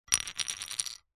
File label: leisure poker dealer button chip drop hard surface; zapsplat